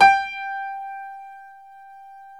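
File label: G4 piano left; Northstar - New Gold VOL-2; BOS.GRAND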